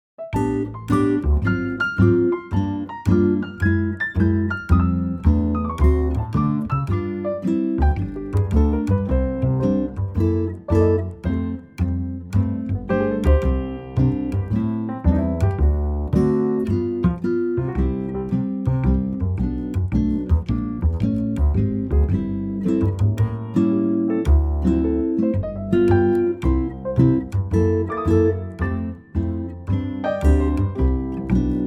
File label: C Trio